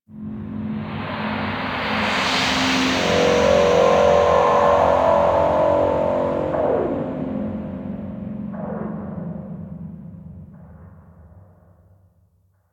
Exterior Deep Abyss Hit, Ambience; Warframe; Railjack

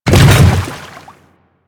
Hammer Boss Step Water, footsteps; Warframe; Grineer

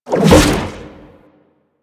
Aqua Board Jump; Warframe; Yareli

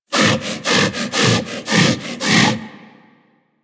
Kubrow Armored Vocal Breath Run; Warframe

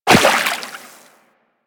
Walk Water, Footsteps; Warframe; Operator

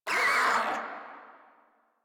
Infested Crawler Hear Sound; Warframe

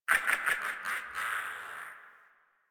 Laugh; Warframe; minigames; Caliber Chicks minigame; boss

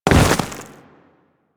Step Ice, Footsteps; Warframe; Grineer

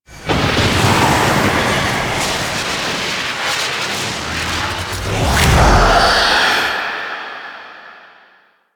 Warp Tunnel Ghost Ship; Call Of The Tempestarii; Wraith Quest

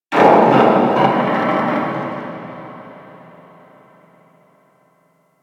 Infested Distant Metal Creak; Warframe; Corpus Infested

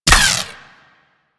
Ghost Expel Spirit Impact; Enemies; Warframe; Priest Quest; Rell Ghost

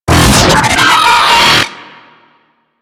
Leaping Attack, Battle Barks; Warframe; Ambulas

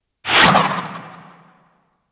Grapple Throw; Warframe; Warframes

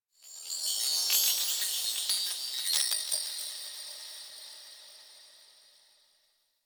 Glass Texture Small, Ambience; Warframe; Cephalon Weave; DE

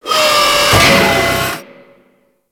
Shipyards Robot Arm Long Move; Ambience; Props; Warframe; Grineer Shipyards

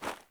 Sandals Run Snow, Footsteps; Characters; Player

